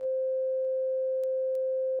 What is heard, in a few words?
Instrument